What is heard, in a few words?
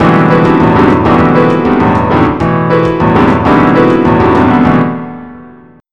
BOSSA; BUSKER L